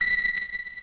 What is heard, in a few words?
warps